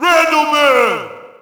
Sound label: Shout, audience; UFC Tapout 2